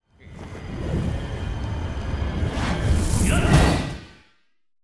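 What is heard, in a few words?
incubus attack; monster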